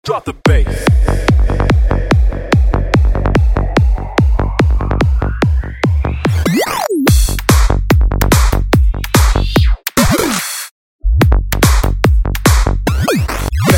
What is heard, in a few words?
Drop Bass